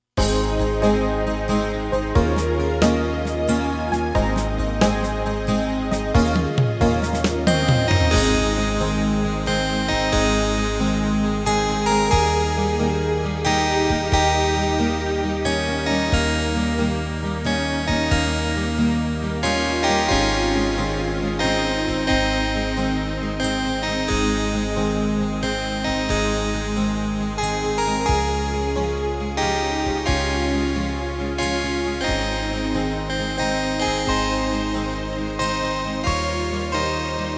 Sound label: Harpsichord; Berounka River; Karlstejn Castle